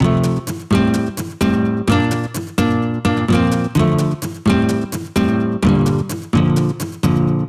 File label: Guitar Loop 128 BPM F Maj; Instruments; Loops; House; Moonlight